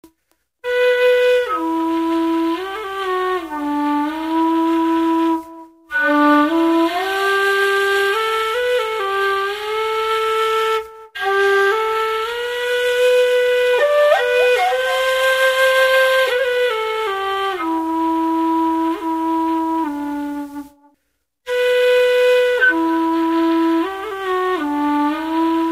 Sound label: Test Blow, Tin Whistle Low D